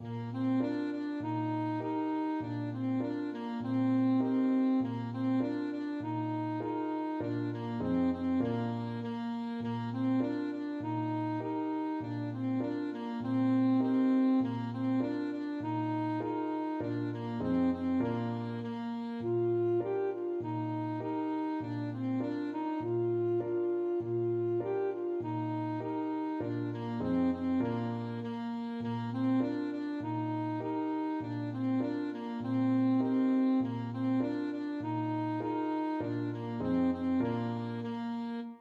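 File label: alto saxophone; mattachins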